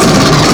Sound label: pulse rifle